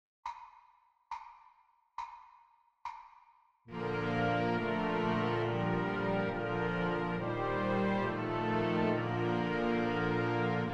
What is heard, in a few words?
Chorale Tune